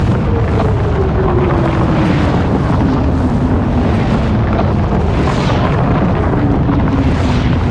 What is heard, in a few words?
meteor falling distant